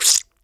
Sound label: blood Short